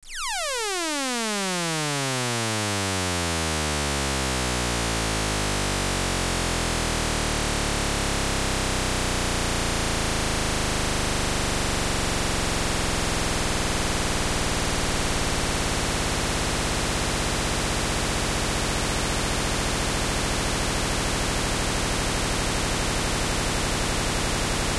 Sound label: idling spaceship